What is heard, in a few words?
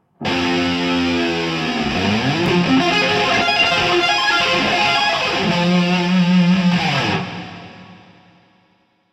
lick; brutal legend